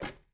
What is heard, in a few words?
reel Stop; netent